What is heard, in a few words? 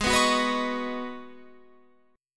G sharp Minor 11th G sharp Guitar Open F, chord; G#m11 strummed